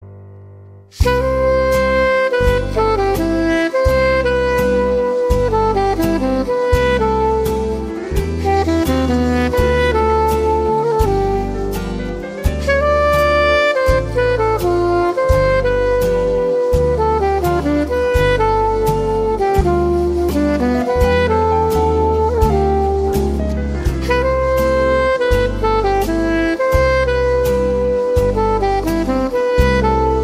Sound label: Cover Sax